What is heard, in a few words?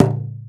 LOG, INDIAN PERC